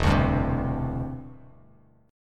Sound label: E Minor 7th Sharp 5th, down, Bass Standard; strummed